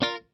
A Major Skank

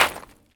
Gravel Impact

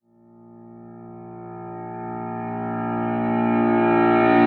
Reversed Piano Note With Clean No Reverb

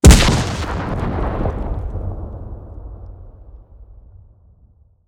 Pipe Bomb

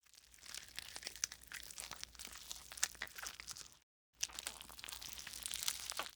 Cutting Slicing Flesh With Knife

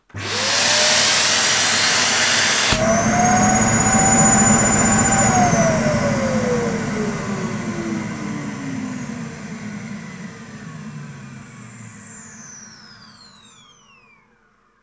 Blocked Vacuum Cleaner Rundown Henry Hoover

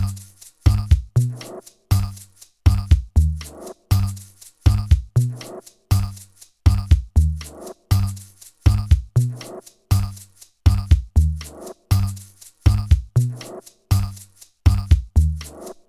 Beat

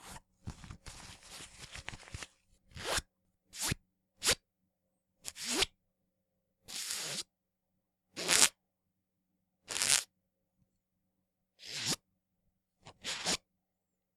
Equip Clothes And Zippers

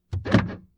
Lock Car

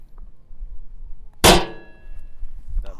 Metal Slam

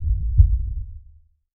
Agressive Heart Beat

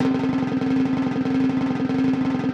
Timpani roll

